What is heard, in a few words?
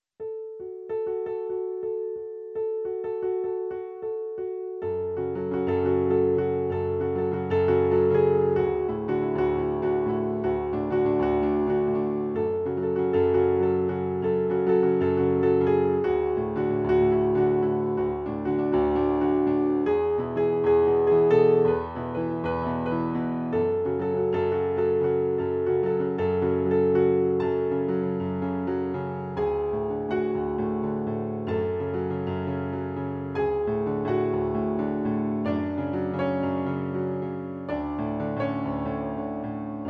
piano